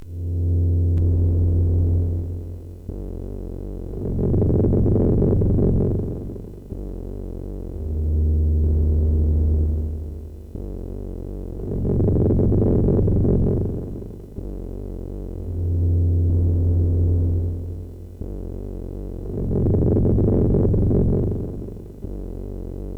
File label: Thunderstorm, sid